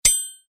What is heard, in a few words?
ping